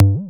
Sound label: Roland E Blip, Roland S100; musicradar-synth-samples